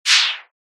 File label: woosh